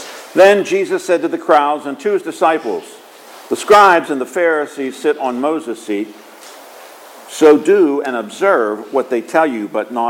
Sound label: Gospel